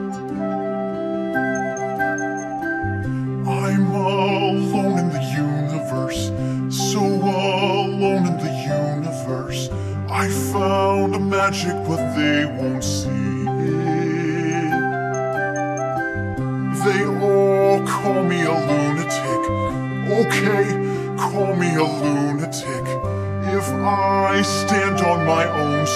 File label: vocal LOW